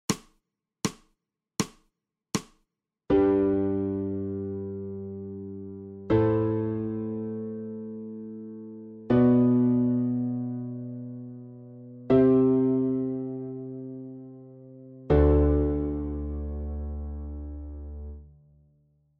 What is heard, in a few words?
SOPRANO